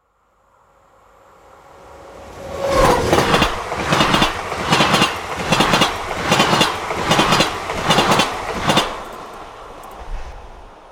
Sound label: Passing Train Trains Paris